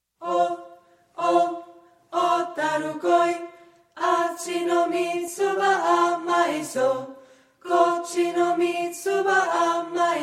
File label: choir